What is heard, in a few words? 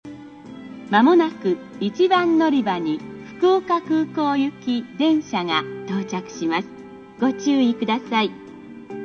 subway airport